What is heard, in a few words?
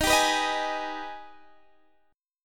Ab Minor Major 7th